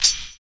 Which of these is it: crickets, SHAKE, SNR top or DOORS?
SHAKE